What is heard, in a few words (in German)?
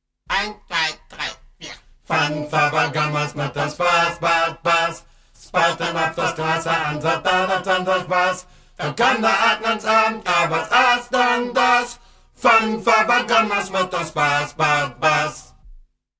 Fanf